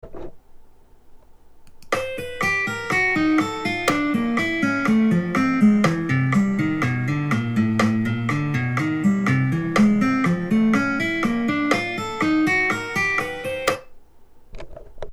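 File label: stretchy guitar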